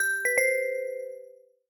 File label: Swing, notifications; Honor; X9c